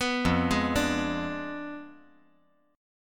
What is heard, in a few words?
F Diminished 7th F down